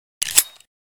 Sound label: melee pick up